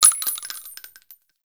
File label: Pistol Shell Droppings; Warframe; Grineer; Orokin